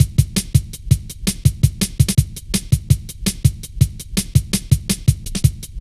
light stepz, drum loops; Zero-G Jungle Warfare 1, 2 & 3

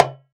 Tabla Sak; Roland BK-5; Percussion

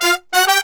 BRASS SALSA; World Grooves; Best Service ProSamples vol.14; AKAI

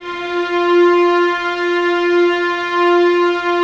VNS F 4, Violins, String Ensembles; Miroslav Vitous

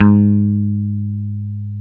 GTR, Dan Electro 6 Str; Roland L-CDX-01

